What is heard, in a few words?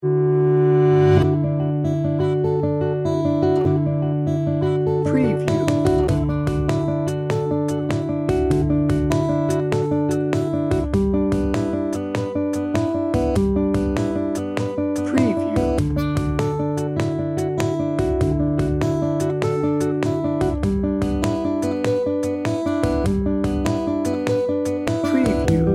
sweet and mellow bed